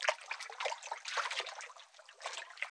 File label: lap